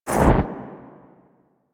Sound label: Void Exit; Powers; Warframe; Focus; Operators; Wraith